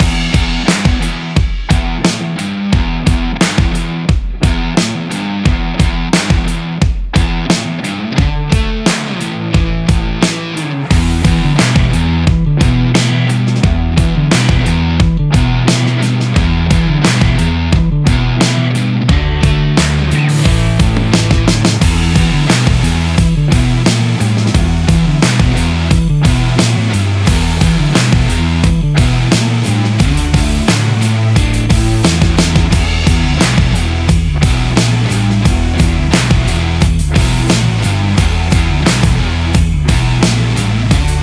Sport Rock Trailer Loop

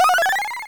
Retro Video Game Computation

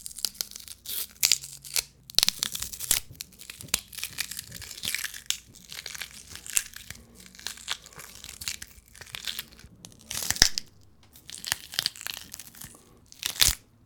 Bones Crushing Celery Crushed In Hand

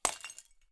Glass

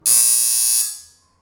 Buzzer

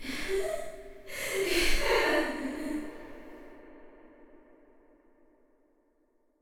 Creepy Woman Laughter With Echo

